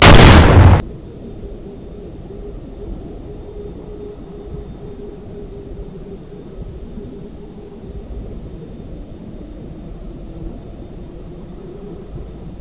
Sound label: flashbang